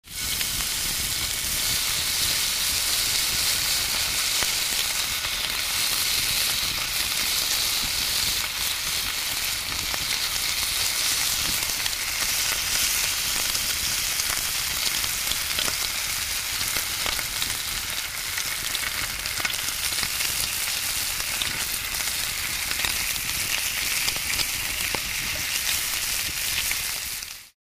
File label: Meat Sizzle Sizzling